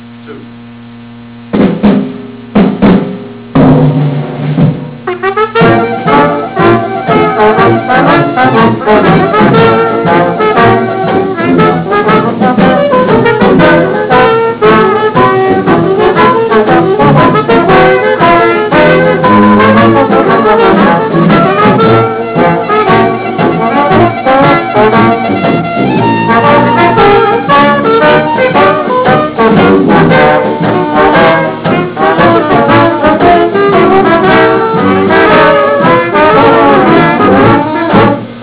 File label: brass